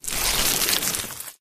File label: human form long move; flood